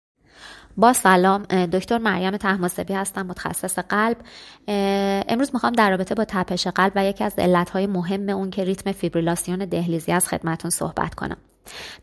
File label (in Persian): tapesh ghalb